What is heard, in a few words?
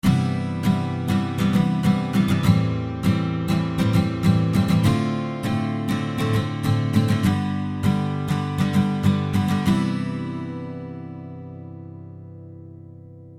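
Guitar rhythm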